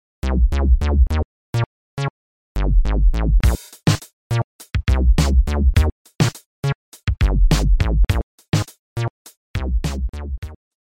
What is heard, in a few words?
bass bassline